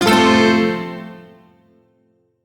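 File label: Shawzin Major Chord; G; Warframe; Duviri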